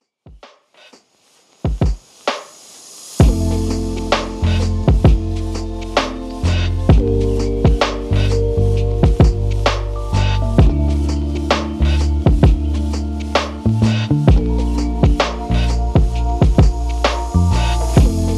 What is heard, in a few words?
lofi chill